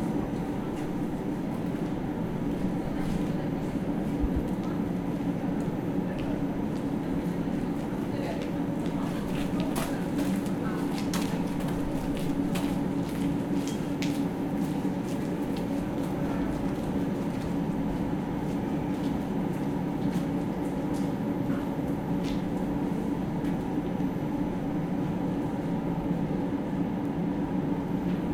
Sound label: train waiting leaving railway station winter; A train waiting at a railway station, then leaving. People passing by. Recorded around Christmas, rainy weather.; railway station hamm; "Train waiting and leaving (wiinter)"